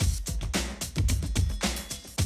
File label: 110, Classic Drum Loops